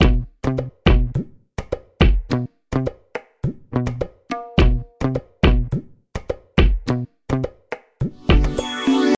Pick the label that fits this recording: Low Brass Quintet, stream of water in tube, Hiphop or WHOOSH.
Hiphop